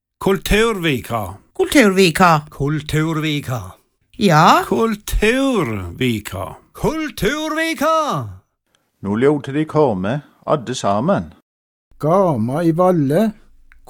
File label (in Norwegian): jingle; Kulturveka 2020